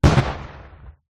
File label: explosion